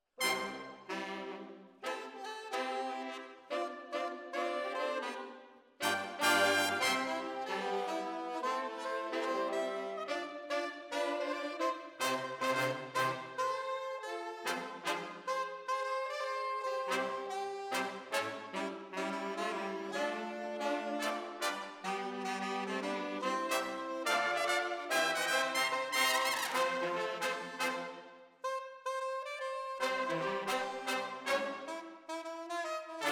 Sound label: ORTF, Jazz for piano